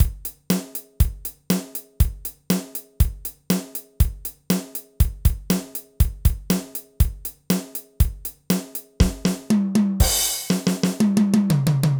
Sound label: rocknroll